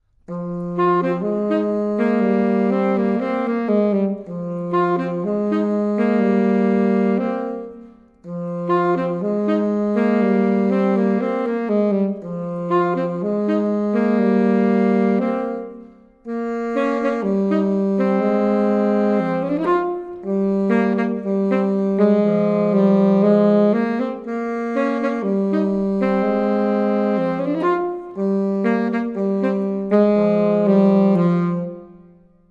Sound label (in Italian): Saxo Tempo